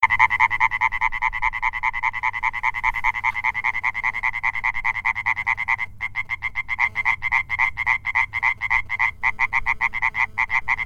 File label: Hyla